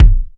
original kick single shot c key

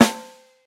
solid snare drum sound a key

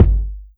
wet bass drum sample g sharp key; Duration 0:01; Mono; kick drum samples

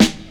2000s hot snare g sharp key; snare drum

